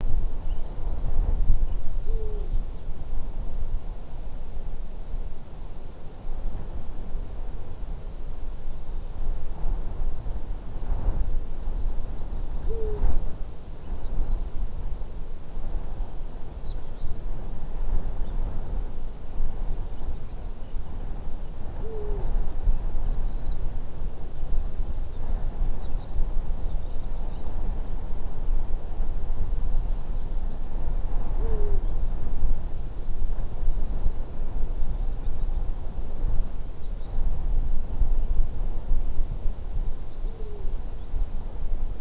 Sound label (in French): Grand duc d Europe; Ossau